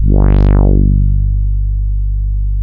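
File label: MOOG MODULAR G1; Hollywood Edge - Giorgio Moroder Rare Synthesizer Collection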